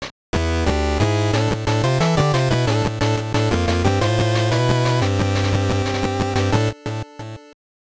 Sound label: you lose; Tony Hawk's Pro Skater; GBC